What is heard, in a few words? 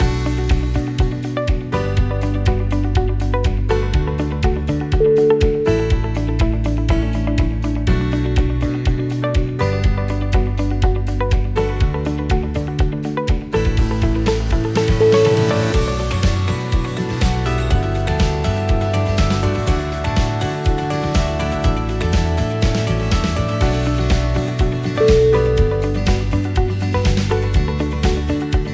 happy uplifting